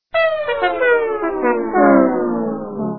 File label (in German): Fanfare Verlierer; Rollmorad